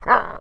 pickup rock; Scrat

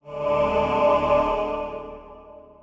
Blood Lance Heal Stinger

